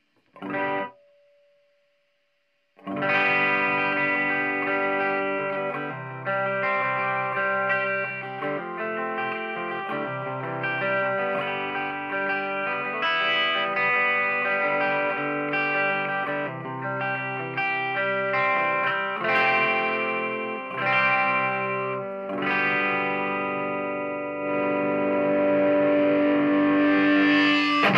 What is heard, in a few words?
es335 dist